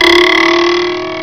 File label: twang ext; EFFECTS